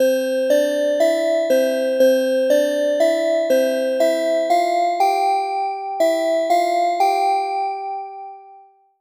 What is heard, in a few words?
unison low detune